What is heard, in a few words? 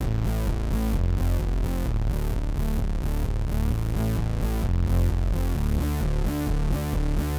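130 Neo-Wave Synthbass G major; Pop Rock; Digidesign; Pro Tools; Pro Tools Essential Loops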